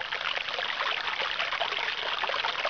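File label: stream loop, water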